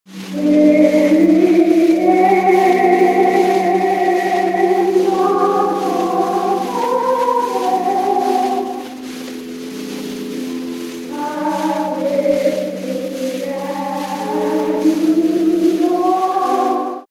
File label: Restored Singing In Church On Easter